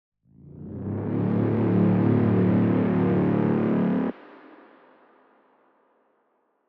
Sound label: cinematic sound braaam film action tension; chakongaudio